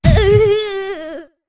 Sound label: Big Fall; Link landing from a big fall